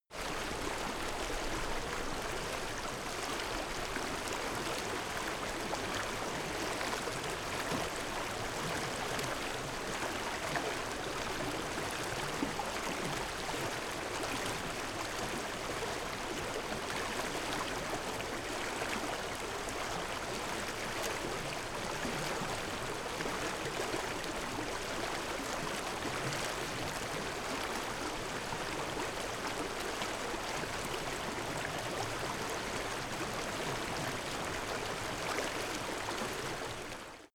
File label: stream bubble dense